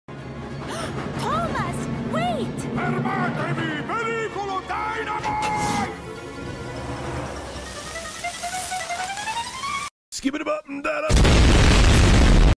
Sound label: explode; thomas meme